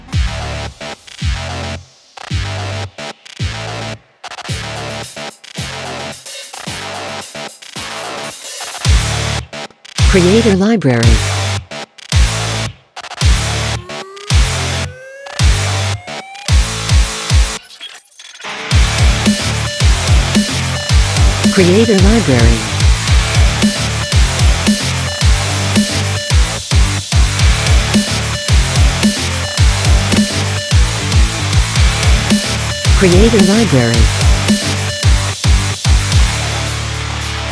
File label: Fruity Groove, Rock